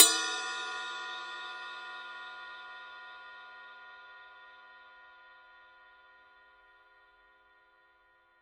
power ride bell, Paiste 20" Rude Power Ride Cymbal; ride cymbals